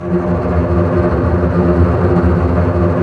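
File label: double bass tremolo, Roland String Master Series; Cbs FX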